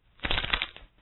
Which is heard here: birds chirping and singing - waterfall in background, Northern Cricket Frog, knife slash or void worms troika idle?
knife slash